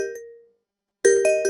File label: message